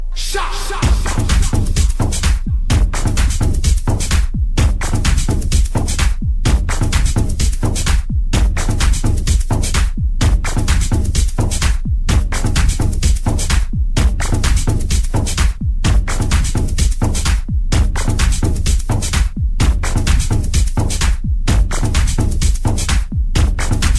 Dark Beat

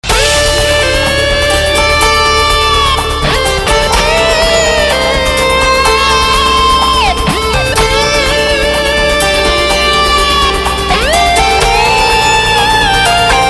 Trance With Guitar

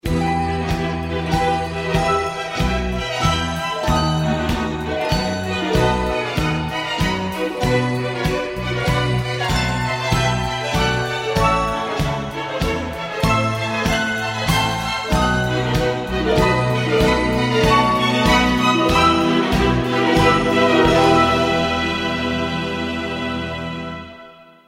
Arp

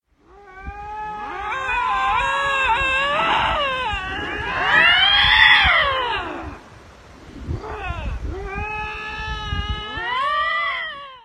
Screaming Lynx

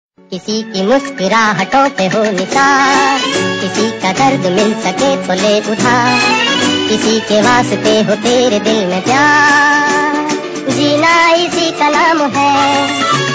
Hindi Romantic

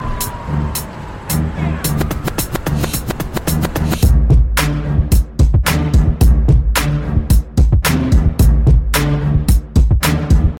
Hip Hop Style

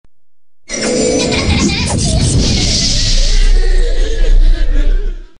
American laugh

